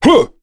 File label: Ricardo Vox Jump, voices heroes en; kingsraid